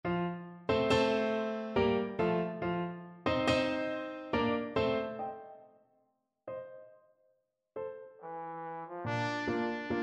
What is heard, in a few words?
viennese blood waltz, trombone